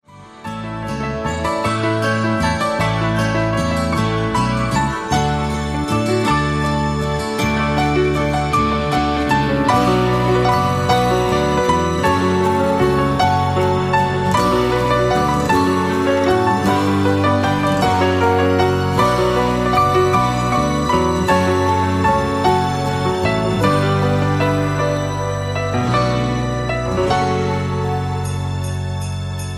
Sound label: Hear The Bells (Instrumental)